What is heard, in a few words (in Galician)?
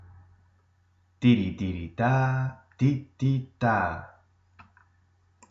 RITMO